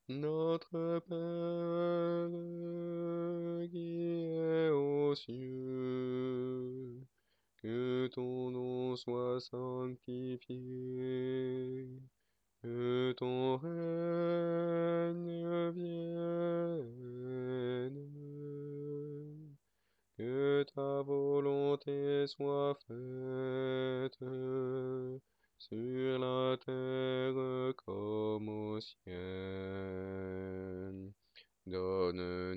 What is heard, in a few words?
Notre pere basse; mariage; Rimski-Korsakov